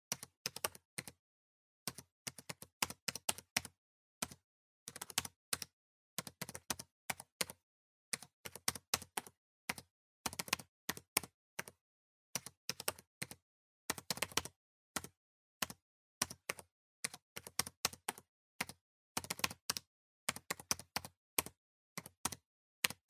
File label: PC Keyboard Mid